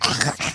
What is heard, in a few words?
attack, rabbit; minecraft; entity